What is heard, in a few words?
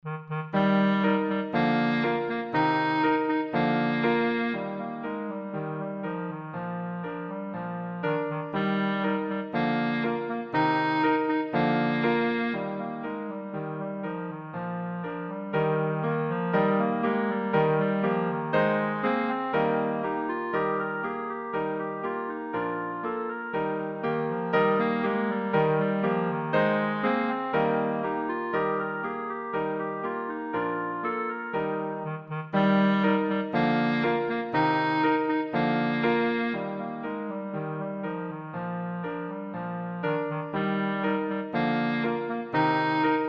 ecossaise, clarinet; beethoven